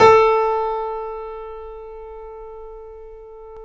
PIA GRND, Roland; Sound & Vision; Gigapack I CD 2; PIA GRAND 1

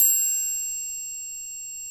ETHNIC PERC; Roland LA

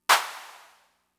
Clap, Fricke MFB-522, Drum Machines

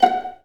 VA PIZZ F#4, Orchestra; Best Service; Peter Siedlaczek's Advanced Orchestra

